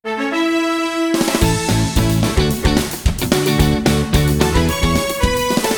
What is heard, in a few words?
Level Complete Sting; PAW Patrol On a Roll